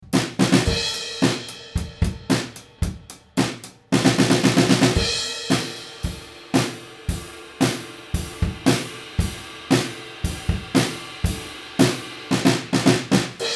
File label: Schoeps CMC6MK2S Drums Front Of Kit